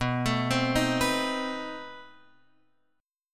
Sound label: B 7th Flat 9th B down Guitar Open F; B7b9 arpeggiated; JGuitar